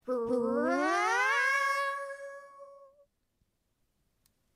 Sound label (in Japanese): ハーブの音色; 声マネキング; 声マネキング対決; フブみこさん; ホロライブ; さくらみこ